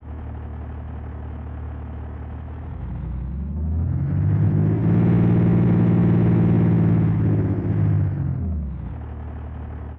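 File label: bus modern exterior, engine sound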